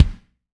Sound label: Warm Kick; Roland BK-5